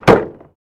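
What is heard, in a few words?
Cessna Door Close Interior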